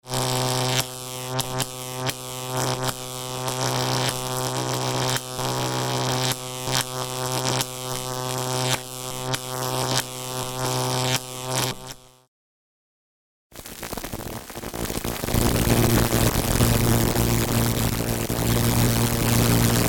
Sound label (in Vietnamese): Am thanh tia lua dien